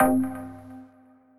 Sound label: ticket created